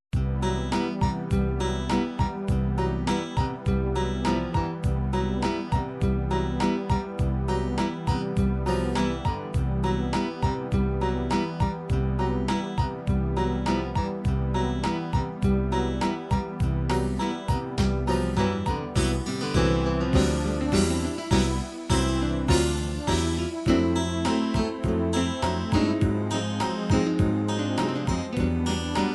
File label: bossa nova